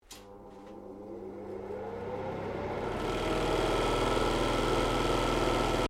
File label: AIRCONDITIONER NOISY START, A noisy motel airconditioner; Household; Air Conditioners